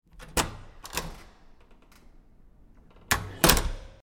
Heavy Wood Door Open And Close With Metal Latch And Reverb, X2